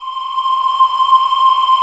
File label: ANGEL BREATH